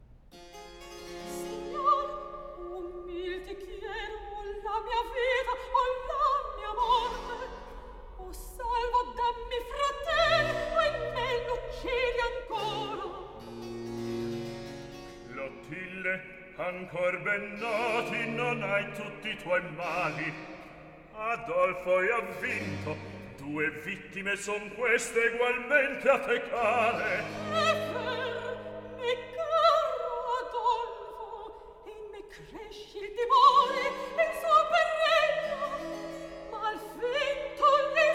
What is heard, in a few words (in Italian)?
Recitativo; Signor, umil; Handel Masterworks; Klassika